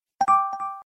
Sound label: Blackberry Z10